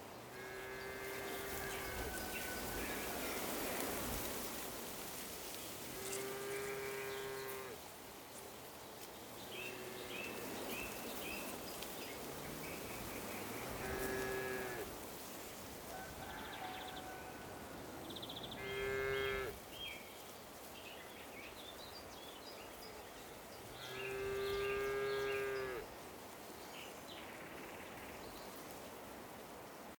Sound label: outdoor ranch birds cows